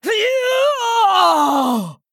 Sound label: Female Blood Elf Death